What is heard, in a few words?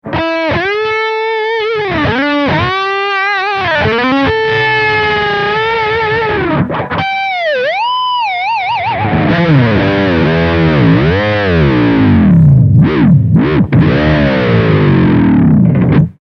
Floyd vib, Gitarre; Floyd Rose; Vibrato-Systeme; MusicStore - Gitarre Bass